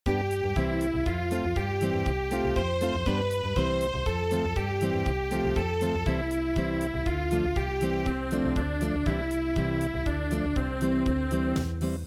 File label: Unplugged